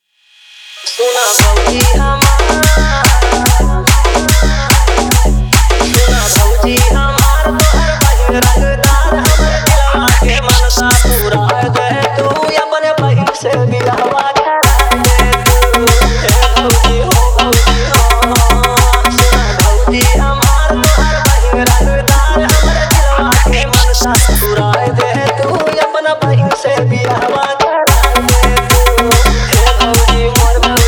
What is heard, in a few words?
Bhojpuri Remix Song